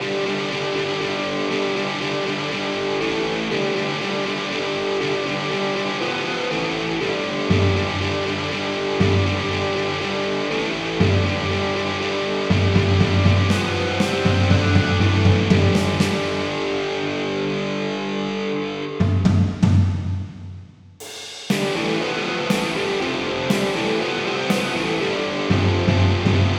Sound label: rock